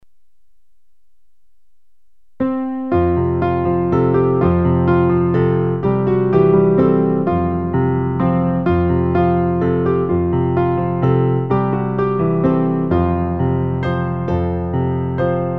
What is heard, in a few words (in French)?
piano